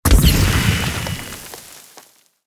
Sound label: gold explo buff normal